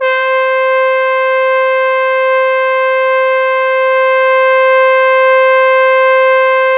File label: C5, Synth Line; 90 s Sample CDs; NiceBeats; SynthLine VOL-32